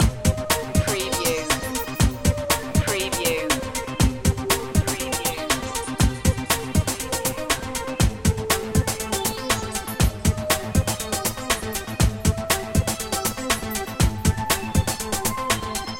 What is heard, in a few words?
technology jam loop